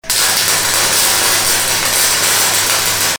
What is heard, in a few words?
electro welding